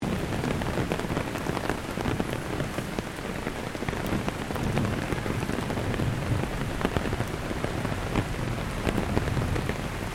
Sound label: RAIN UMBRELLA CITY LOOP STEREO